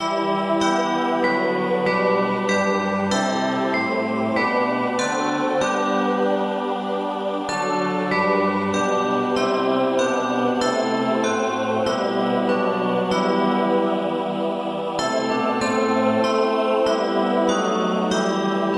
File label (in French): pour cloches anglais et choeur